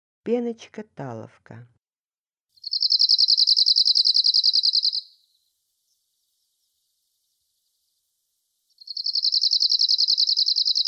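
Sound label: penochka talovka golos, Arctic warbler voice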